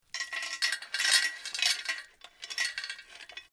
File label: Metal Chain Movements; Chain sounds